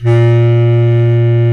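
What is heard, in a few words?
Bass Clarinet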